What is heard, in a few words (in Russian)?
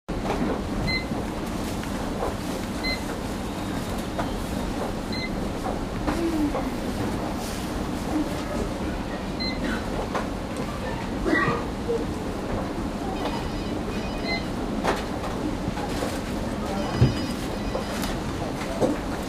zvuk pikanja kassy